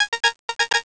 arpeg; Amiga Soundtracker Sample Packs

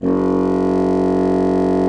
BASSOON F#1; Xsample VOL-6; C BASSOON

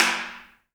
HAIRCUTS, KENYA DRM, Heart of Africa

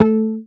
Bass, MUTES

